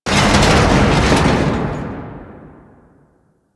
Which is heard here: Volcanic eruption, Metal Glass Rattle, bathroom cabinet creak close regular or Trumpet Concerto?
Metal Glass Rattle